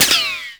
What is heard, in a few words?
Exalted Sniper Ricochet; Warframe; Frumentarius